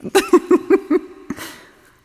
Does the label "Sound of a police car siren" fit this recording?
No